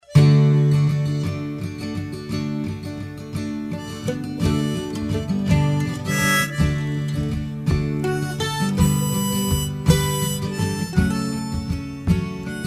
Irish Singer; San Francisco Celtic Singer; born in the Clyde Valley town of Johnstone, Scotland

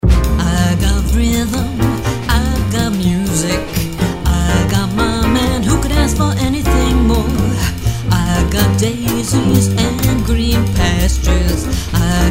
Jazz Singer; San Francisco Jazz Singer

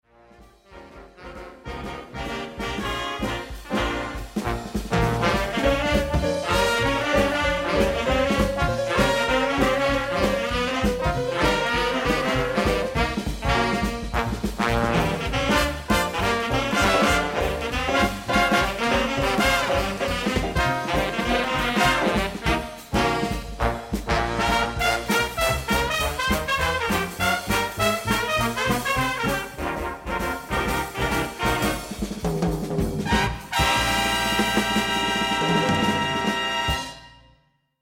Big Band; Washington DC

